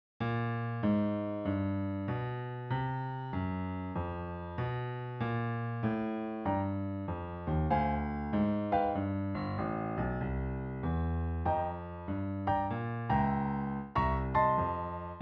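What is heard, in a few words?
accomp, accompaniment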